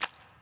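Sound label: wet run, footsteps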